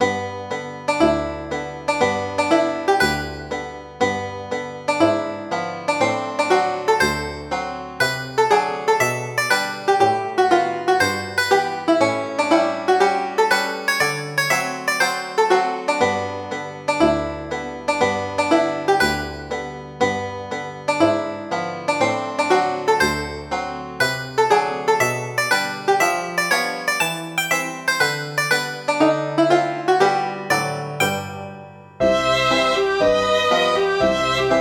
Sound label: Plantation; synth